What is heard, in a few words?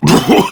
Pain Prime Grineer Male; Tau; Battle Barks